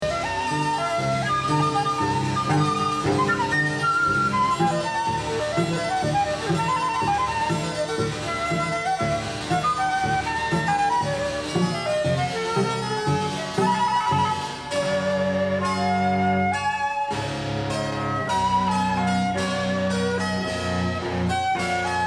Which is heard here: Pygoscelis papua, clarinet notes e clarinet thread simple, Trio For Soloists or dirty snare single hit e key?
Trio For Soloists